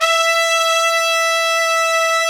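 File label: trumpets section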